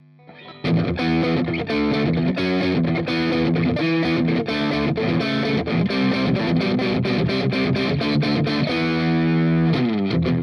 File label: Middle SC Gain